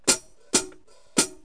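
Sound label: 3 small cymbal; drums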